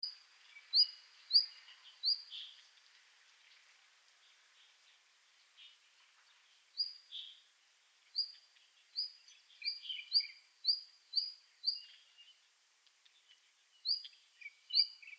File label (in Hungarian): kékfarkú hangja